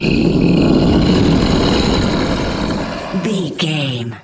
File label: troll monster growl